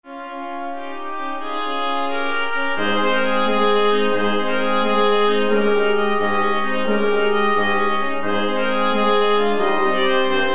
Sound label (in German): blechblasquintett